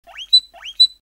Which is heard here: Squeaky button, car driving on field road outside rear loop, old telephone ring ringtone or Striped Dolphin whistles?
Squeaky button